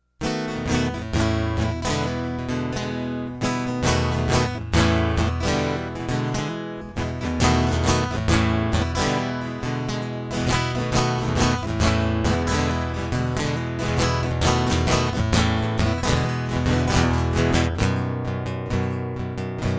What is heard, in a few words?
Tight Guitar Loop; hyper terrestrial